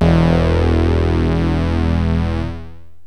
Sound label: star lead, yamaha rm1x synth